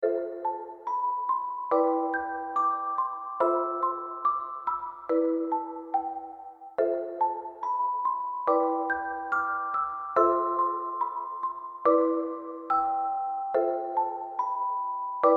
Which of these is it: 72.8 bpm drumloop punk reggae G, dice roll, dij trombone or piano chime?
piano chime